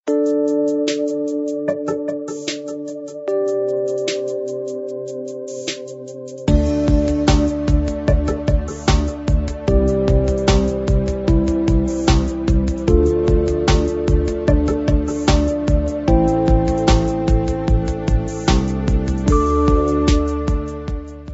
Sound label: soft silence; alternative